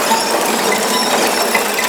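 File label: A#3 squeal; WAX SPROCKETS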